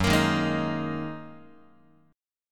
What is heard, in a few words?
Gb 6th Add 9th Gb down Guitar; strummed; Gb6add9; x,2,1,1,0,2; JGuitar